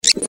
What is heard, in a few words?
rubber noise; industrie wirtschaft; baugewerbe